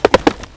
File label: Unicorn Run